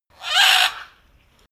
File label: bird screecher; natur-tiere; voegel